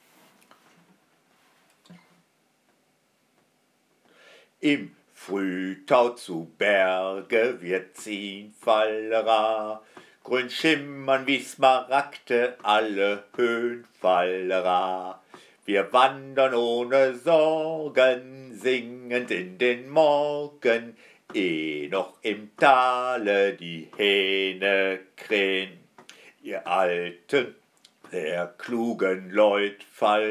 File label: Audio Recorder